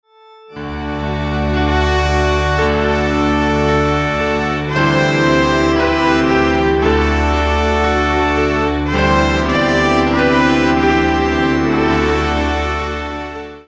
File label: The Great Amen instrumental